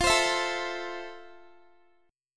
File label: F Major 7th, Guitar; FM7; x,20,22,21,21,x; 4E,4A,5D,5G,5C,6F; strummed